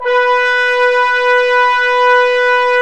BRS BONES, Orch Ensembl; Roland L-CD702

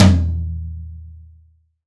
TOM, PIN STP TOM

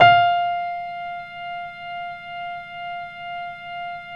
VOX PIAN; MORPHEUS

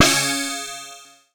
C#, BELL GONG, Synclav FM; Big Fish Audio

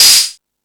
Found Sound, Drum Machines

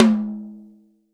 TOM, Real Drum Kit; REAL DRUMS; ROCK KIT

